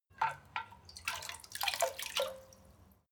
water bucket, Bauer; berufe

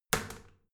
toilet seat wall open heavy, badezimmer; haus-garten

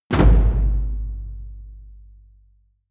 drum hit loose small; percussion

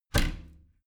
bathroom cabinet both close heavy; objekte; tueren

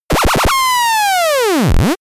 synthetic signal; digital

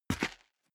step boots concrete grit single right; Footsteps; schritte

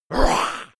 beast growl high, comedy cartoon; soundpool

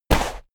step walk sand; schritte

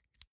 insert xlr extension; objekte

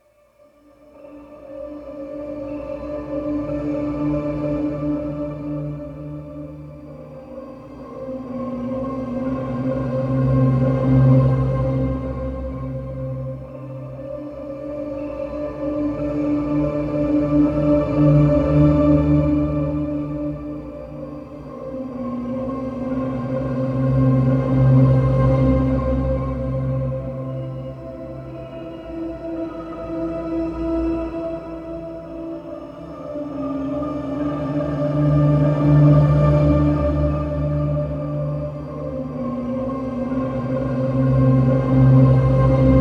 interstellar, flaechen

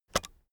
keyboard external space release soft, computer; kommunikation

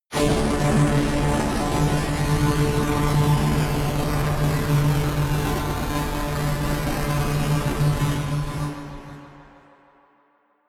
cinematic resonator string; energie

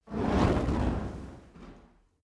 fire torch whoosh long soft; swooshes-swishes

